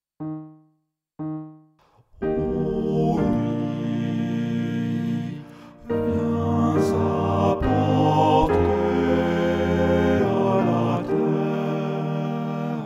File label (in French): soprane